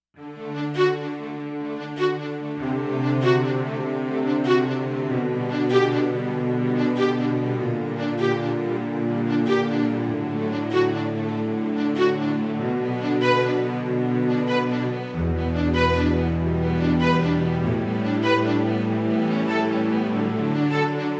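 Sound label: string ensemble; JUNO-Di Patch Demo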